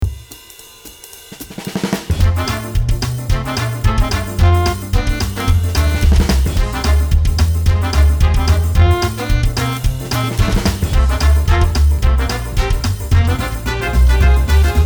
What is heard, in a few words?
Swing mood